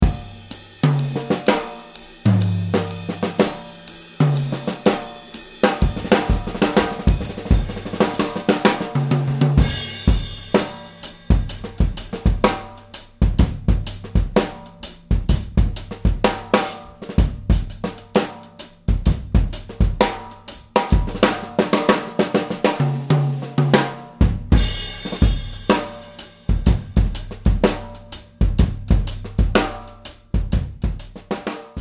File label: with room, drums; room mics